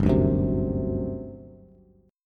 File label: G Major 7th Suspended 4th, Bass; strummed